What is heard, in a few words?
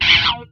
WAH CHORD, Distortion Gtr; PlatinumPhattCD2; E-MU Producer Series Vol. 8 – Platinum Phatt